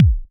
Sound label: Kicks Electro